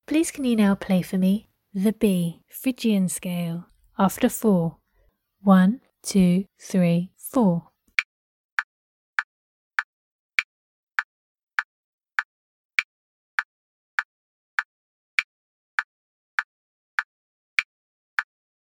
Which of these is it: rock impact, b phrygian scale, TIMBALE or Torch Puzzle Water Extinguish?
b phrygian scale